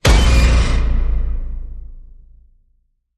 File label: Suspense String Hit